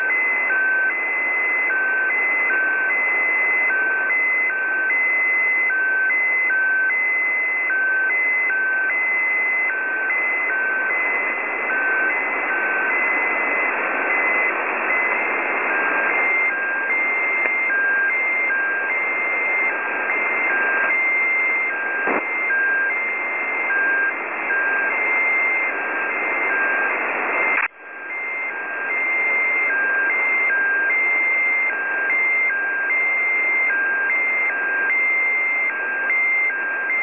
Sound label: 10255 khz